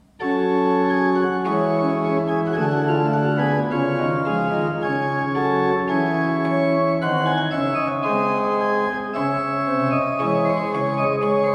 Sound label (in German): kleine orgel